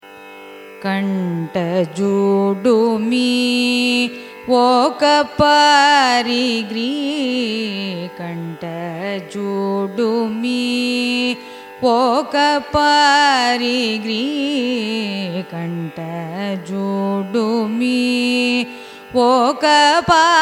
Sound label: raga vachaspati carnatic; carnatic music